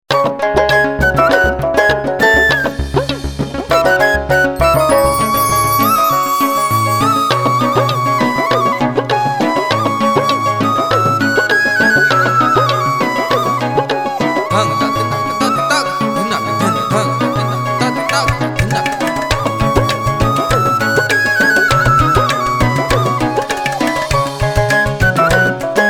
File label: Banshi, ringtones; Walton; Primo-F3i